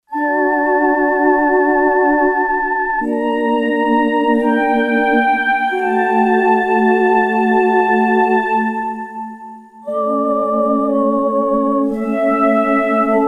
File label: Light Choir Whisper, Yamaha TX802; Performances; Factory Patches